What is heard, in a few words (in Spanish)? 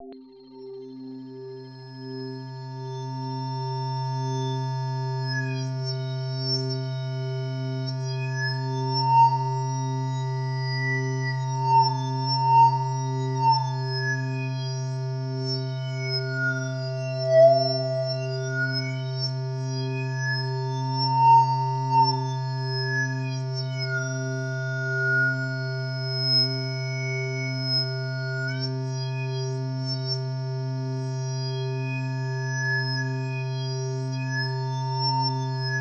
Loops mutantes